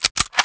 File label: reload; Pirates Of The Caribbean; DUEL